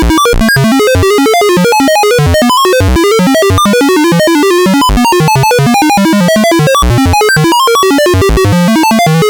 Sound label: Sample and hold white noise controlling VCO; analog synth 101